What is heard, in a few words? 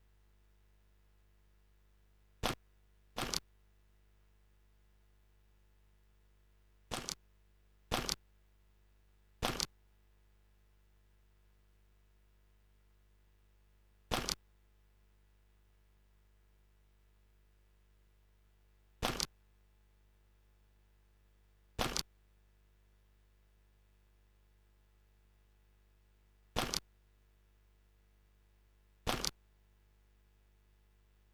stereo instr loop